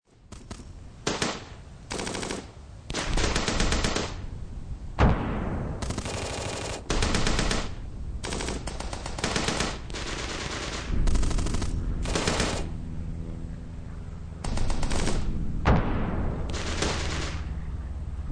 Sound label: Warfare World War Two Battlefield Forest Planes Distant Explosions; WWII